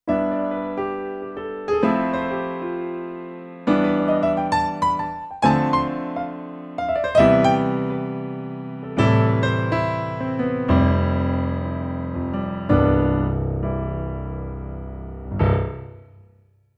Bright Piano

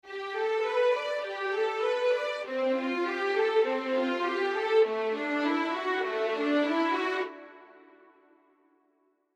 arpeggios

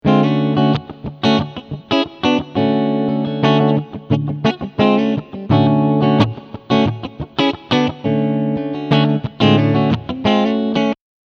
clean strumming beide picku

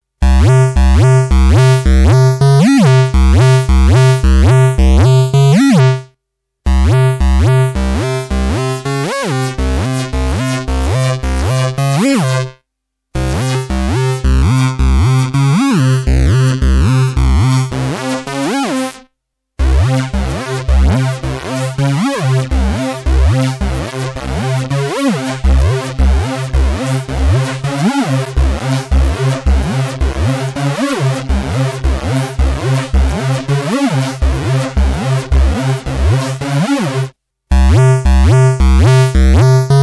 VX90 Lead

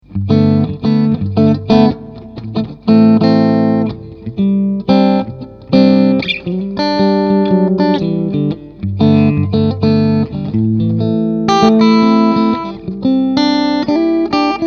Tone King Sky King clean channel triode

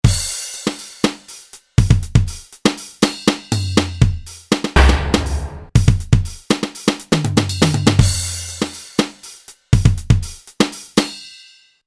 HR16 Loop

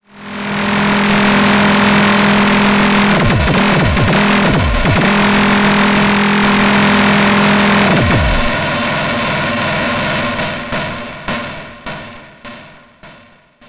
Loops And Drones

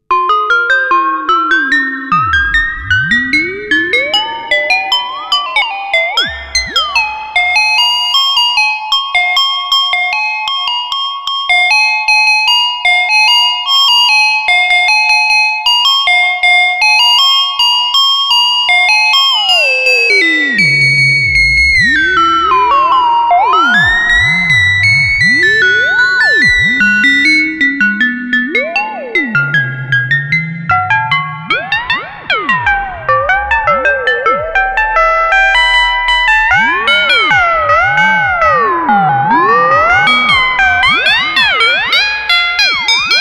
FM Bells

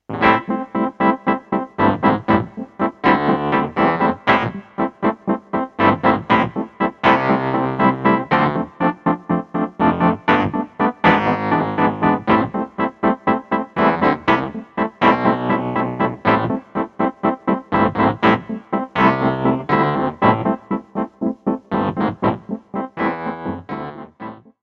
Reed EP

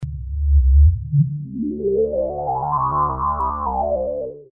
bass w Filter

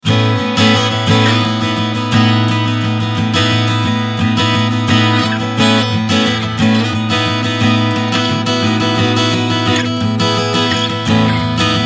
Strumming Mic

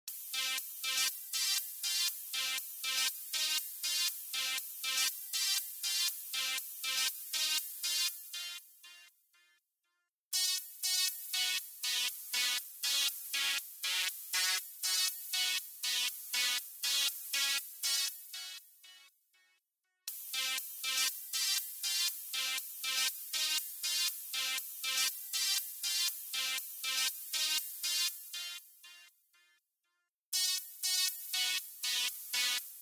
Super PWM LFP Energy Stab 120bpm C

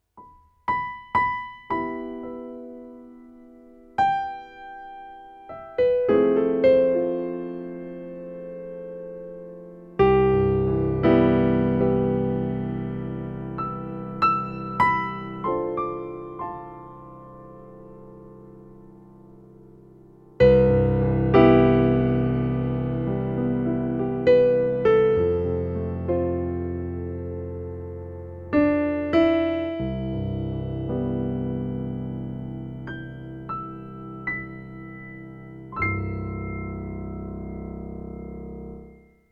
Casio PX-S3100 Grand Piano Concert

